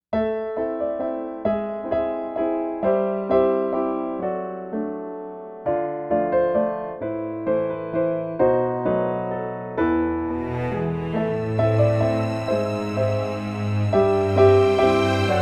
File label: Sad Music